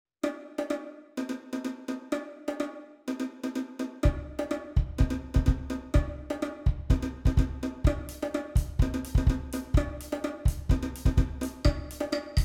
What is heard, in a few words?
Junior Percussion